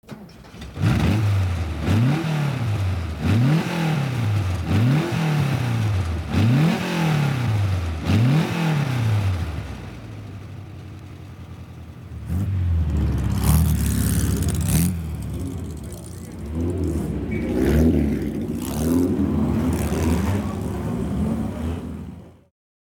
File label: 356 carrera, Porsche